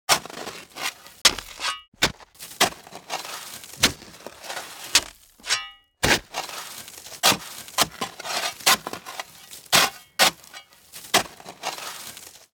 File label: Digging; operation-arctic